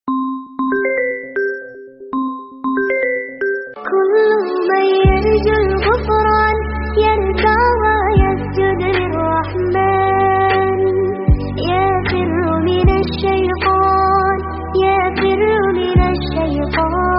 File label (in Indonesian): Nada Dering Islami; Versi Samsung